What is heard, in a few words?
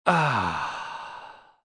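Sigh Long male, Sigh, male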